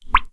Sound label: Fluid Bubble; Puddle; puddle plus ios gamerip 2018